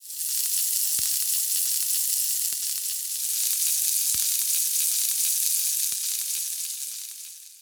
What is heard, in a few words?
sizzle; Thalassophobia Restored; Jobgear; Scientist